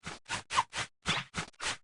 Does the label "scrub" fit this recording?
Yes